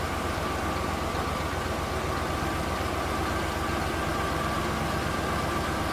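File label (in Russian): бесперебойная продолжительная работа двигателя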